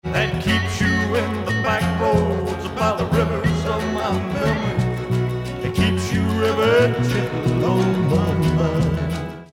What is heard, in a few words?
gentle harmony